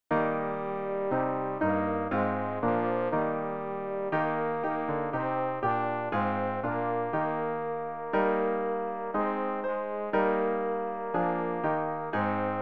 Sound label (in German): tenor; ref-gesangbuch; schoenster herr jesu